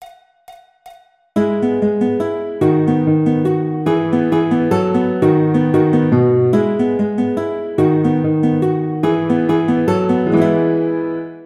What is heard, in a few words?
Waltz Guitar; classical